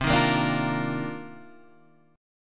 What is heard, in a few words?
C Minor 11th C